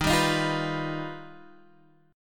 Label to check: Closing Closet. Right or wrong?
Wrong